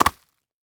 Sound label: Foley Footstep High Heels Leaves And Twigs Debris Jump; Foley Footsteps